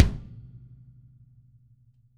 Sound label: kick, Drum Kits